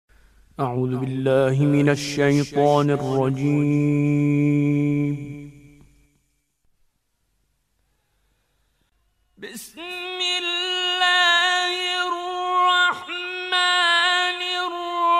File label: Mujawwad; Mansouri